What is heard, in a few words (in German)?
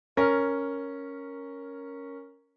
Intervalle Doppelt Ueb Quinte Des; Reine Verminderte Uebermaessige Intervalle